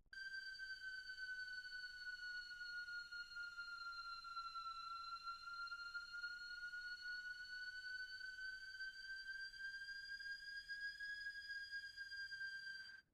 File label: tone; baldis basics